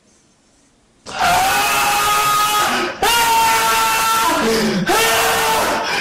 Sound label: cat screams like a grown man